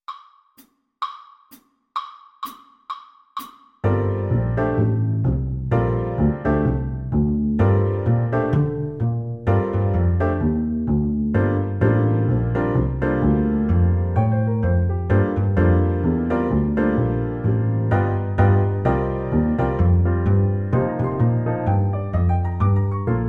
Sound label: Drum Set Play Along SHUFFLE, Jazz; Drum Set Etude A-1 Shuffle Track; DRUM KIT & AUX PERCUSSION